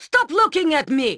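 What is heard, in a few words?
attack; NWNDS; Edwina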